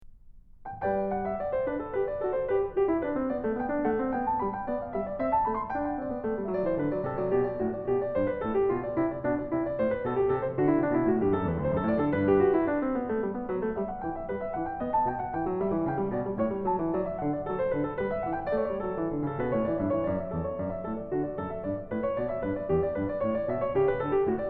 Courante; Magrath Book 10; From French Suite In G

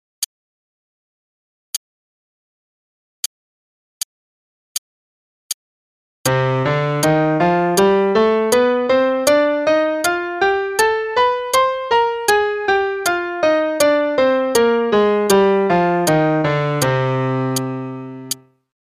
c melodic minor Scale only (qn=80); 8th notes = 2 octaves; CM Level 5

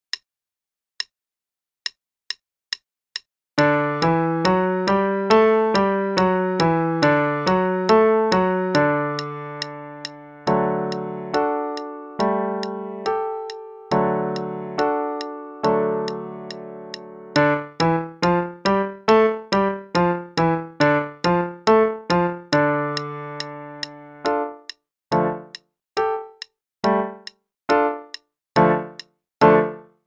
Warm-up in D, Major then minor, qn=140; Level 2A - 2nd Ed - page 63

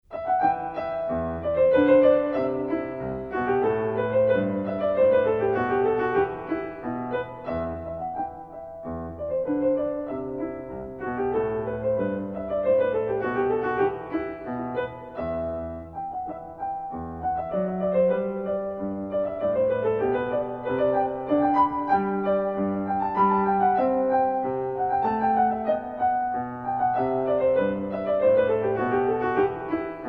Hornpipe in e minor; Purcell

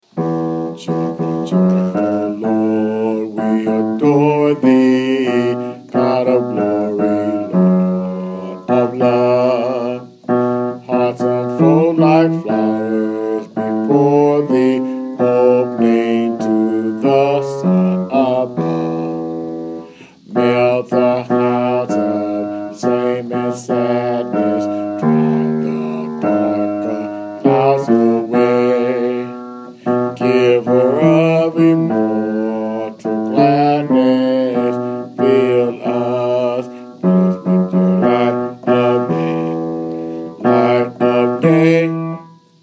bass

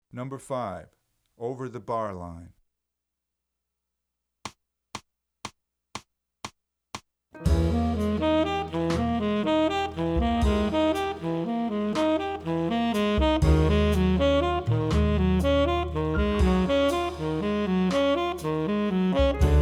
Over the Barline; 14 Jazz and Funk Etudes; CM Level 6 Solos (jazz)

